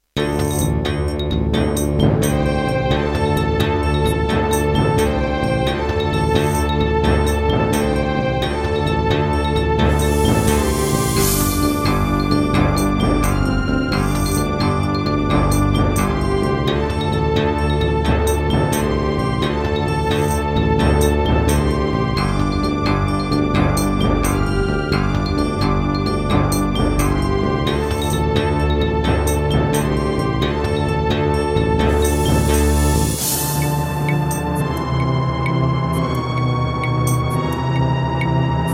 Terratec MiniWaveSystem TTWT, wavetable; Heretic

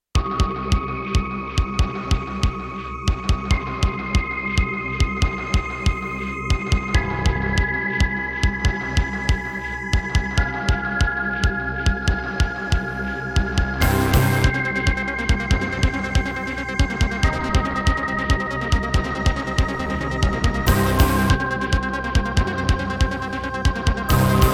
Roland SC-55mkII; sigil doom episode 5

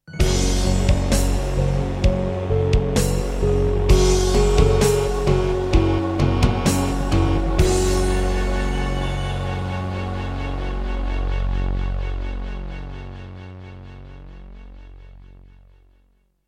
Introduction, Korg X5DR; Doom (Sigil)

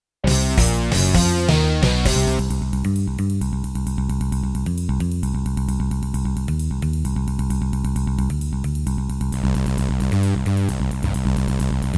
Yamaha MU90; sigil doom episode 5

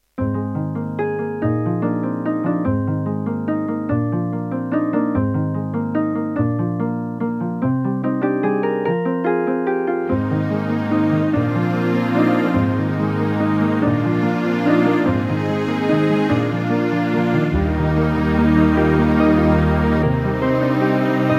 Irish, Terratec MiniWaveSystem TTWT; GM MIDI

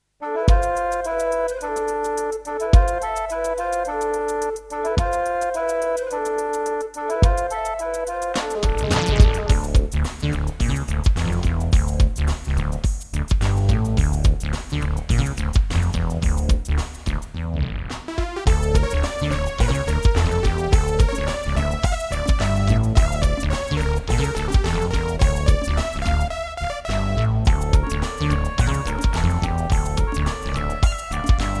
Alesis NanoSynth; Lost Vikings

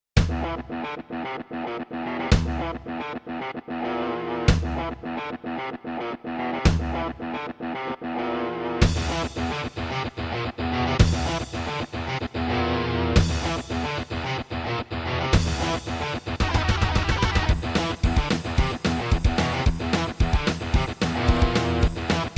Roland SC-88ST Pro 88MAP; Doom

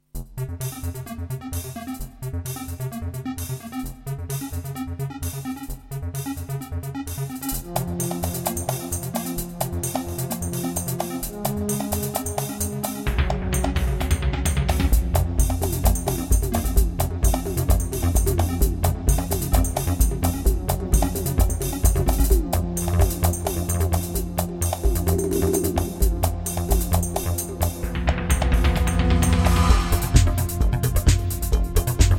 EMU SoundEngine; Descent; Level 1

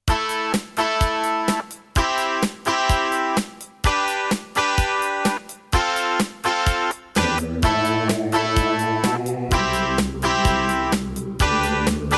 Wave 1, Boss Dr. Synth DS-330; Raptor

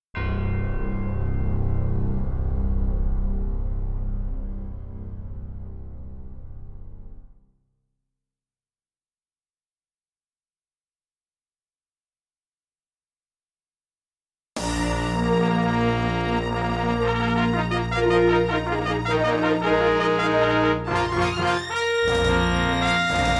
Intro, Roland JV-1080; Dark Forces

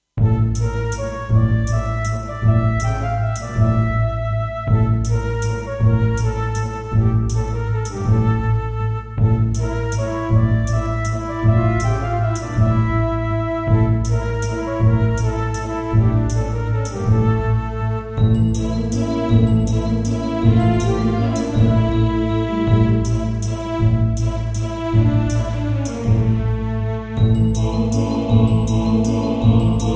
Roland SD-50 GM2MODE

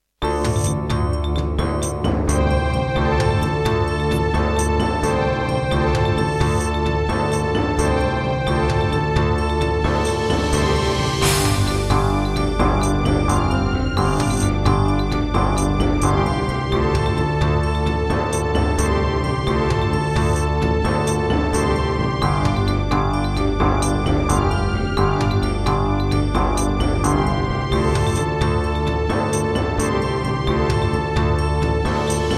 Serdaco McQub, wavetable; Heretic

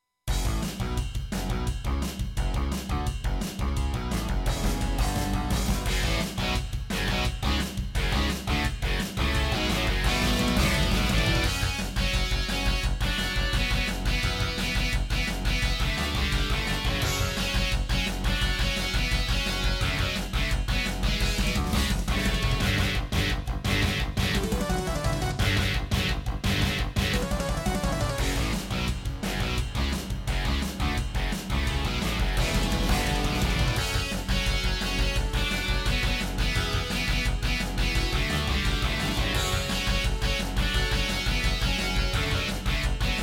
Duke Nukem 3D - Intro, Serdaco X3MB Buran

